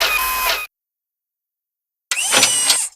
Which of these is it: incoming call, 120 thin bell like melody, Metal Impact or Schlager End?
incoming call